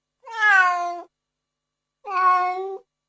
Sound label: Cat